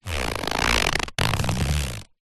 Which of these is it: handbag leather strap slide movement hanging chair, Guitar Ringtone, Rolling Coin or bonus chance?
handbag leather strap slide movement hanging chair